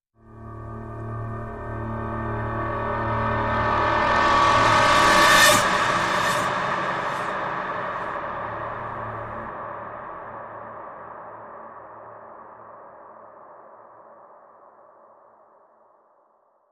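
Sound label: Reverse Very Scary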